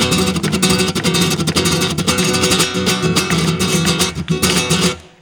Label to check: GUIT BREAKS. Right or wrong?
Right